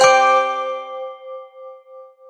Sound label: correct answer